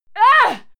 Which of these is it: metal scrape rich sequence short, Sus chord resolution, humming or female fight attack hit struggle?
female fight attack hit struggle